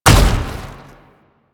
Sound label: Step, Foley; Enemies; Warframe; Proto Infested; Babau